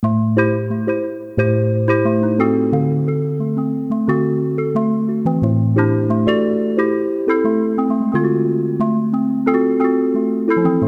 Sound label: WOOD; transoniq30